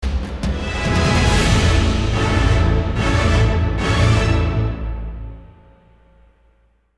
Enemy Ship Final Stinger; Skylanders Giants; PS3; Wii; Wii U; Xbox 360

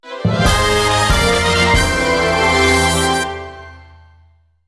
Jingle ~ Full Clear

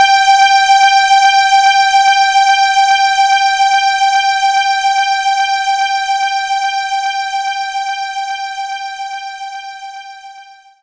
strings G5

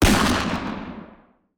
Weapon fire single revolver

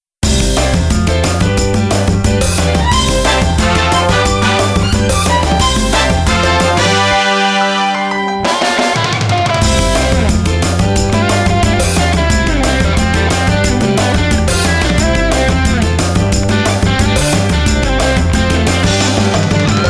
LA BAMBA (Mexican Folk Song); Dance Dance Revolution Extreme 2; PS2

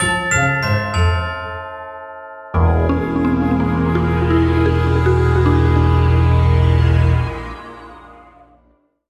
Crewmate Down!; Pikmin 3 Deluxe

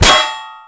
Physics Metal Solid Impact Bullet; Counter-Strike: Global Offensive

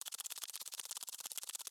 spider mini run loop

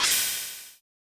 Swoosh

